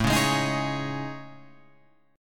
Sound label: A Major 7th Flat 5th, down Guitar Open F; AM7b5 strummed; JGuitar